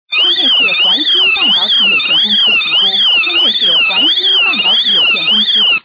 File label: Alarm Sound Slow